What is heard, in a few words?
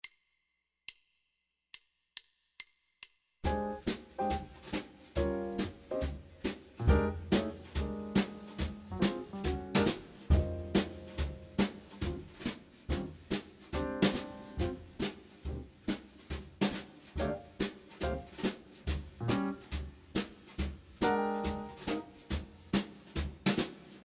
Blues Bass; 8th grade all state